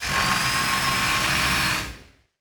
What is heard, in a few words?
servo big, sci-fi sounds, mechanical